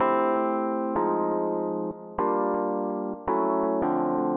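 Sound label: minor jazzy rhodes chords, 110 bpm; SYNTH AND ELECTRONIC LOOPS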